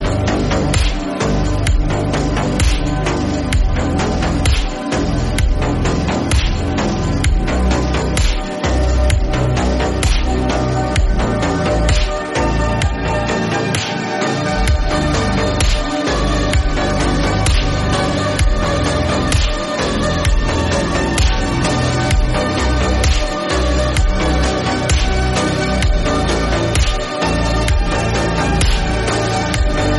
slowed reverb instrumental

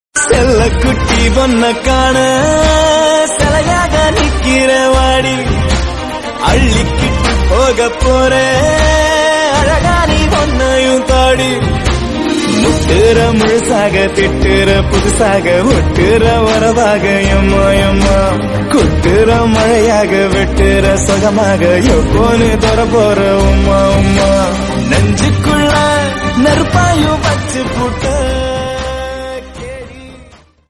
love bgm tamil movie

